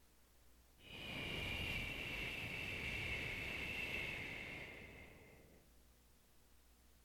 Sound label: Wind Higher